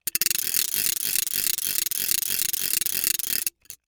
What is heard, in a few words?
Cartoon Toy Noisemaker Rattle; AdobeCC